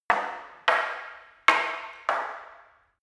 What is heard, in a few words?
staff wood fighting sequence